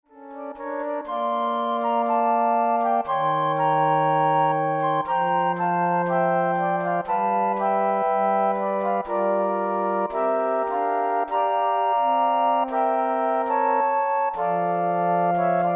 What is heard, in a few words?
Flute Ensemble